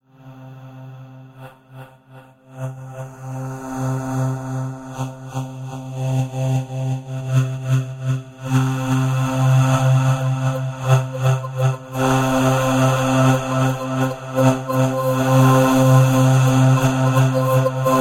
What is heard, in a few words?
Spirit Voices, Confused and warped breathy ghostly voice effects.